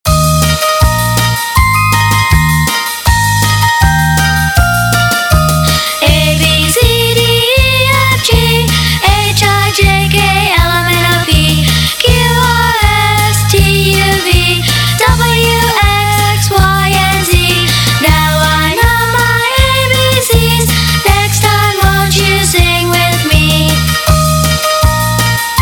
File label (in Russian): detskie pesni na angliyskom yazyke abc song